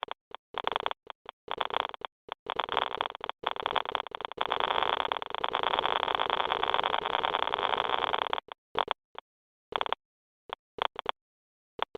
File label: geiger counter static; SFX